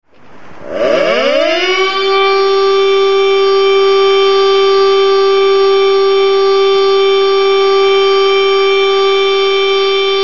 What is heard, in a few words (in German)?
sirene zivilschutz entwarnung